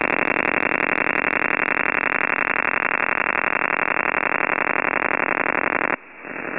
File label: Superdarn ionospheric radar